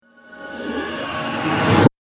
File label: Sudden Stop Riser; Torment; Rise And Dive Sound Effects